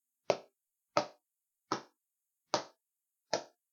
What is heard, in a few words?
Cricket ball Hitting Bat